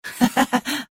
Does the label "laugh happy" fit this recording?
Yes